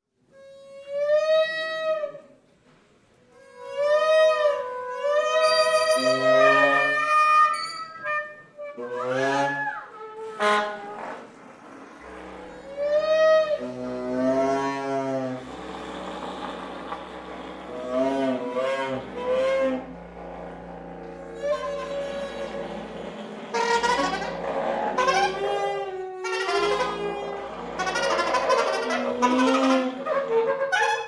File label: SAX MAFIA